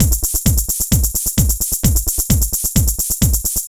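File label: drum loops, left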